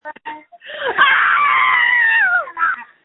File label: screams